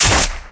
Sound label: punch